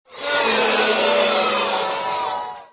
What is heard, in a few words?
boo, basketball; nickelodeon basketball stars 2015